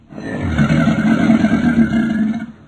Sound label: croc grunt; minecraft; technic